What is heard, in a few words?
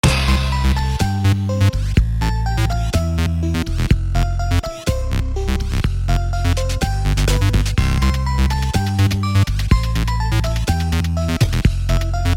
Lobby Jingle; Space Is Key Christmas OST